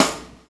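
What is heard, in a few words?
Snare, Roland MV-1200; Roland MV8800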